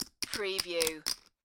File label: Poker Chips Stacking